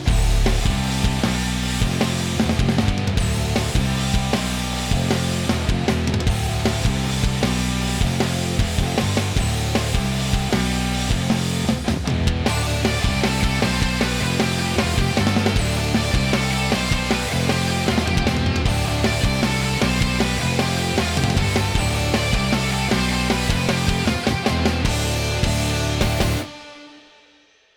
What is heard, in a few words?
Clenched Fists; monster energy supercross the official videogame 2